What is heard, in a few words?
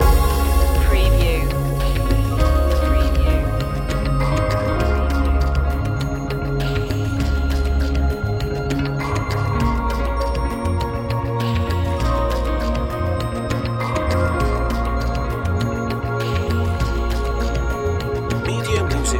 building up loop